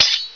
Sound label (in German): Schwert; PC Gamer IT CD 48; 1602 AD; 1602ad demo